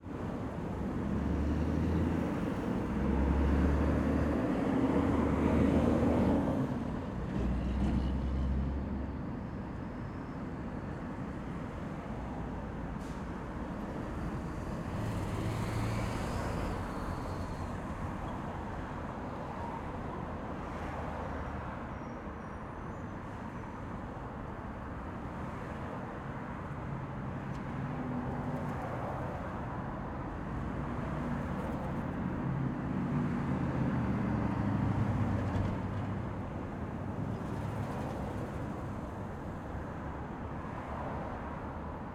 Traffic City Street From Center Median, Many Trucks; 2496SoundEffects; Surround In the City 5.1